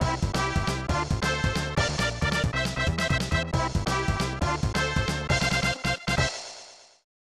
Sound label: Goal